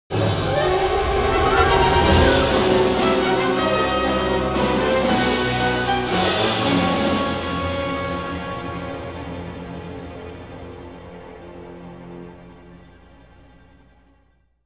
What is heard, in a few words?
Ramp Down, music